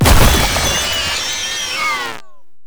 Door Ink Blob Explosion; Gameplay; Warframe; Shadowgrapher

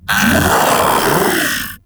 Infested Splitter Joined Vocal Attack Medium; Grey Strain

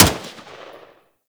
Grineer M16 Wraith Fire; Weapons

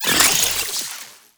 Flechette Reload; Weapons; Warframe; Tenno; Oraxia

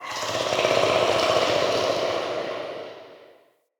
Male Vocal Idle, Enemy; Mockery; Mockeries

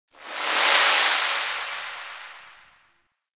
Power Rift Buff; Gameplay; Warframe; Zariman

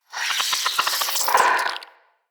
root spider vocal chatter; Animals; Spiders; Vocals

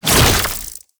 Wall Climb Jump; Warframe; Oraxia

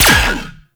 Laser Fire; Warframe; Corpus; Ambulas

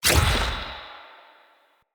Bow Shot; Weapons; Player; Fey Thorn Bow

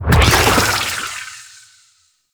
Aqua Board Speed Boost; Warframe; Yareli

